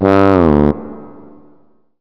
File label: trompet; PC Gamer IT CD 48